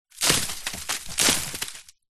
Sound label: tree branch small leaves fall from tree hit ground